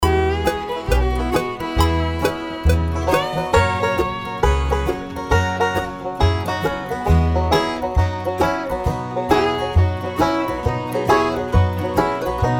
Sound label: banjo solo